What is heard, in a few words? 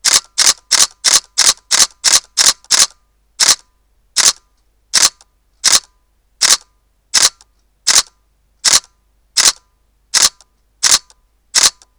Sony Alpha DSLR-A500 continuous lo RAW